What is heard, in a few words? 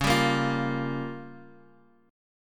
Db 6th Flat 5th Db down Guitar; Db6b5 strummed; JGuitar